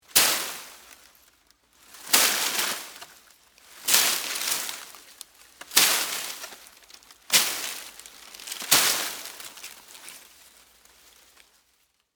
Foliage Hit, With, Machete, Clear, Brush, Palm, Frond; Texture; Multiple, Times; Rustling; BlastWave